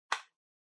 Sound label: Portable Radio Select Switch